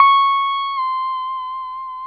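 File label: pedal steel bend down C#6, Left; PEDSTL BENDS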